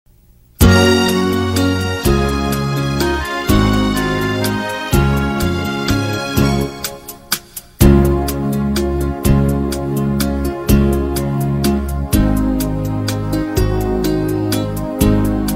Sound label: Karaoke